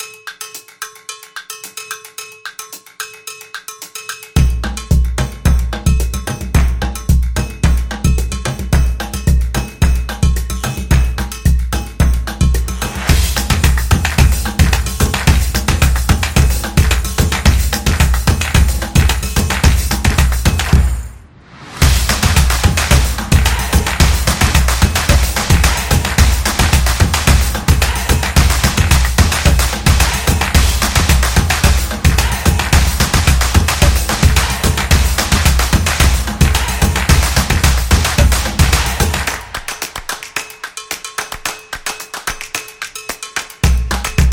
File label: Drum and Clap